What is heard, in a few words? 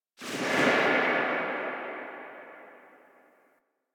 Pistol Tail C; Bard